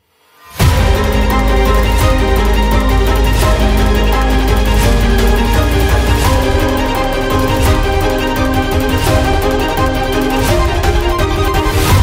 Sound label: Revolutionary music